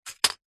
leisure game disc drop into grid tower game play single; connect 4